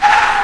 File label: vehicle skid; hl2